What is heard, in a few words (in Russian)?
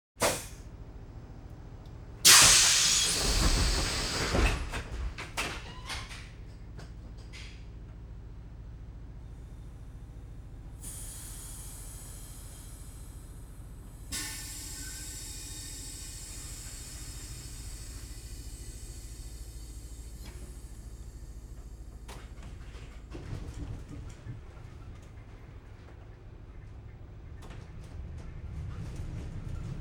Monorelsovyi poezd